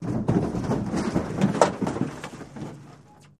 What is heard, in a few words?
Auto Crash Lrg Side S, car crashes